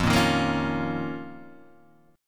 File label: F 7th Suspended 4th Sharp 5th F down; F7sus4#5; strummed; JGuitar